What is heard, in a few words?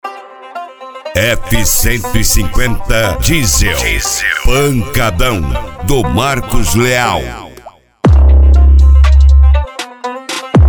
pancadao